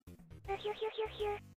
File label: laugh